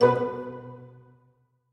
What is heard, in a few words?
Goblin Rise; King's Quest Chapter 1: A Knight to Remember